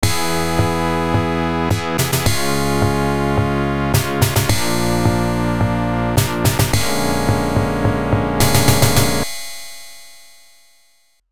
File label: Stage Clear; Big Karnak